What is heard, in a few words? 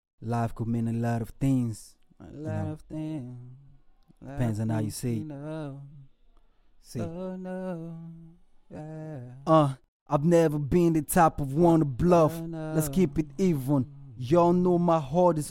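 rapsing hip hop rnb 69 BM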